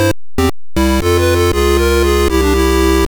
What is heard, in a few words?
Game Over, The Game Over jingle; Sharp X1